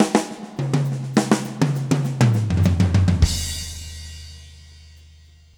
Fills 203; Zero-G; Monster Beats

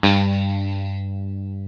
EL SITAR G1; E-MU Formula 4000 Series Vol. 3; Analog Odyssey

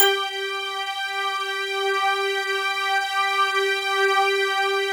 PERCP, PERC PAD; Sound Gallery - Charged

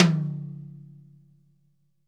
TOM, GM drum set; AKAI S-Series CD-ROM Sound Library VOL-8

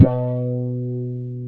WOW GT1-B1, WOW GUITAR; AKAI S-Series CD-ROM Sound Library VOL-7

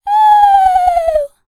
FEM. HUMAN, Vocal, R&B & Dance; ILIO; Vocal Planet VOL-4

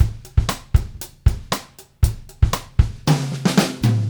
Stealth 117; Zero-G; Monster Beats

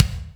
Kick, Akai MPC500; Toolkits

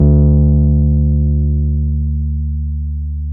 PNO, H.Pianet; Roland LCDP10 Keys of the 60s and 70s 2

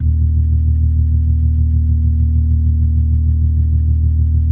EB1F, B3

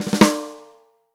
ROCK SNARE CHROMATIC, Real Drum Kits

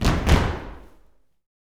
YOUTH FEET, SLAP STOMP; Spectrasonics; Vocal Planet; Blues Soul

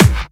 Dubstep Kicks; Bassdrums; Vengeance - Essential Clubsounds Vol.5

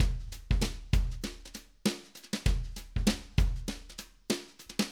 Acoustic Live Drum

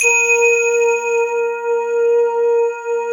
SYN, Roland, digital

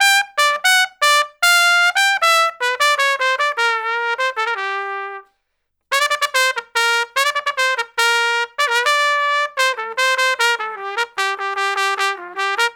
Trumpet Solo, Jazz Traditional

